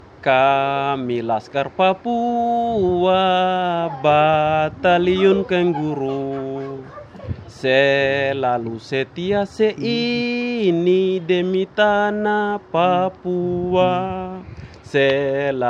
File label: Papua Song